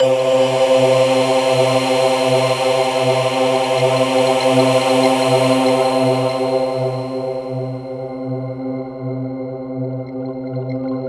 C3, Synth Line; 90 s Sample CDs; NiceBeats; SynthLine VOL-11

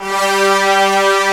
ORCH HRN; Greytsounds VOL-1; SYNCLAV TRAX

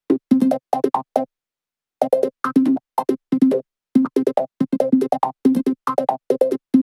140BPM C; Vengeance - Essential Clubsounds Vol.4

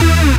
synth e, Rave Synths; Dance Synths

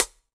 HH CL2 R, Drum 1 Dry; East Collexion

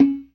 PERC, Synth and Organ; InVision Interactive; Keith Emerson; The Most Dangerous Synth and Organ; CLASSIC C3 4

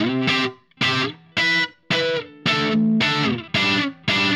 Guitar, Indie Rock

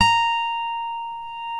6 STRING GTR; OMI - Universe of Sounds

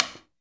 STICKS; InVision Interactive; Percussion Slam

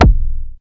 deep; HIP HOP; Pro-Rec - Synthsonic

